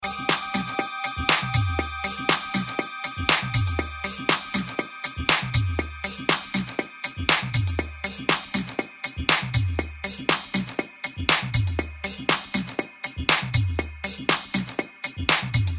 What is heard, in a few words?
Phat Funk